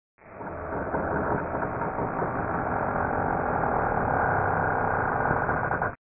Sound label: creaking; runeston